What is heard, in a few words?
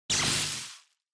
Electric Shock Short